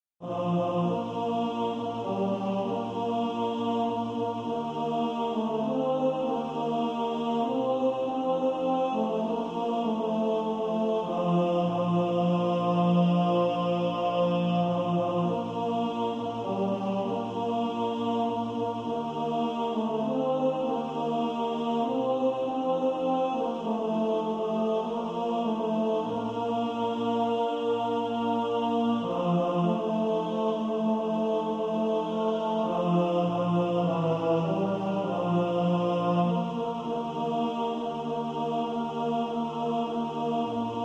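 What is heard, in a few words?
tenor, practice music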